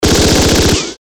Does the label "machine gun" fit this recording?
Yes